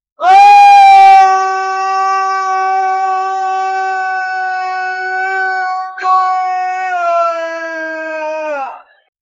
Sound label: roman soldier scream